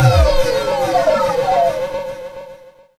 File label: D+B